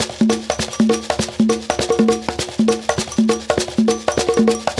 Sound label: African Tap; African Contemporary; Spectrasonics; Supreme Beats